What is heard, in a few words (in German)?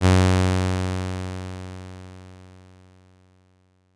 Saite G Bass 415Hz, Leise Lauten; Chor 6 (Gg); (Saiten 10 und 11); Stimmton:G